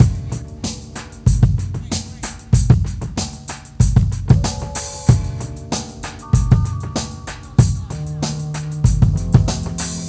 drm 095, Breakbeat, 095bpm; Best Service ProSamples vol.40; Breakbeat 2; Rhodes Rhythm

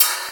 SPRINGS, Percussion; InVision Interactive; Percussion Slam

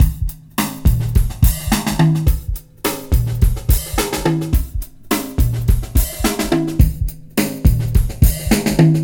29 FUNKY MONKEY 106 bpm, Loops Breaks; POTBreaks

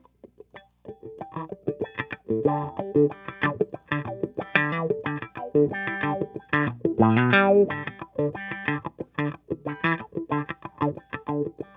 FADE IN WAH, Funk Gtr & Wah; E-MU Producer Series Vol. 8 – Platinum Phatt

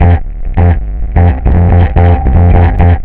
RYTHMIC BSS; Big Beat Samples & More

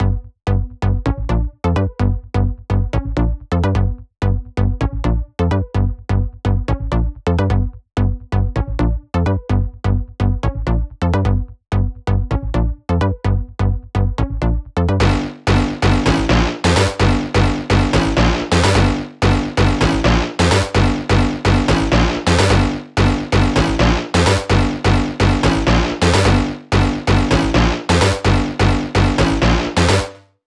128 BPM Drop Leads NoSC, Bassline, G; Vengeance - Essential Clubsounds Vol.5; Old College Kit

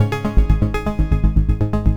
NRG Arp, trance

